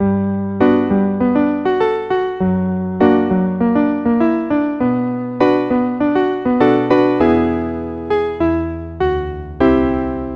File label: piano